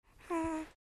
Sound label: Ahhh